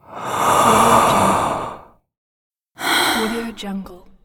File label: exhale